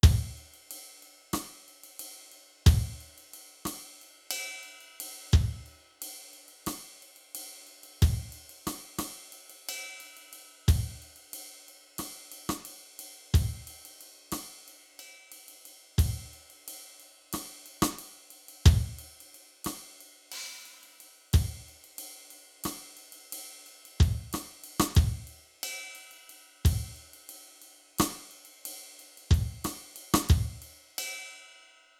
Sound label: centered drumloop 90